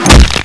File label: wrench flesh; GoldSrc